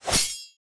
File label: sword slash